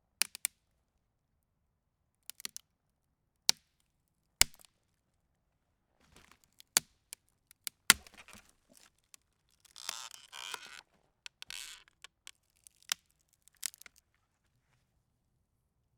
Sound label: Wooden Branch Break In The Forest